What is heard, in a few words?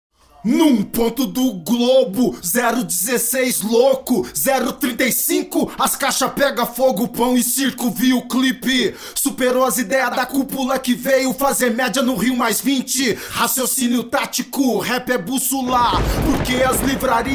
acapella for djs